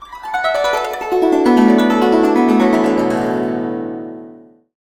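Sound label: guzheng; Spectrasonics; Heart of Asia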